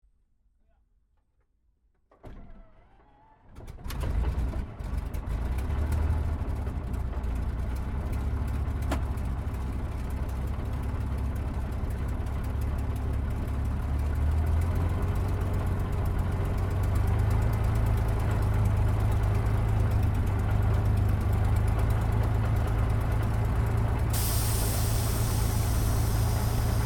AIRCRAFT SUPERMARINE SPITFIRE MK-VIII STARTUP INTERIOR; Airplanes; Airplane Sound Effects